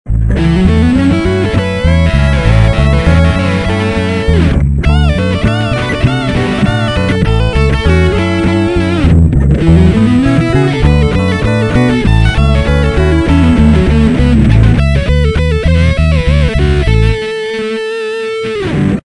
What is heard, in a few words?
12 bar swing